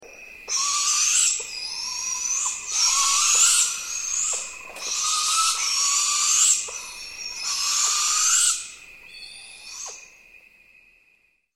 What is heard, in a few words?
Barred Owl Begging; birds